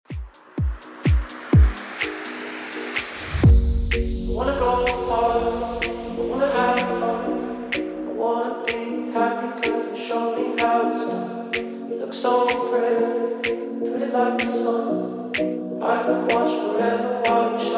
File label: Top40 Redrum 126bpm